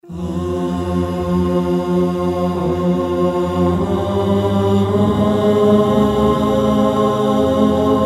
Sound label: vocal